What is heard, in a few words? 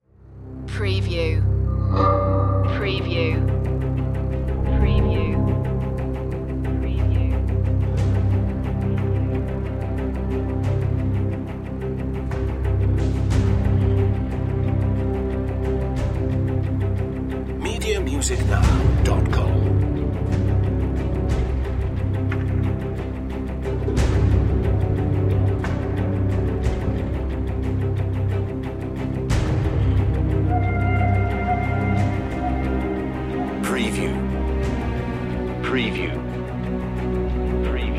big hollywood dramatic score